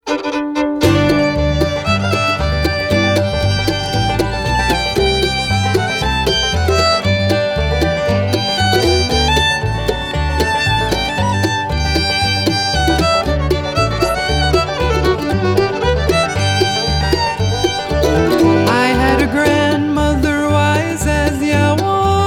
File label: bluegrass; Live Band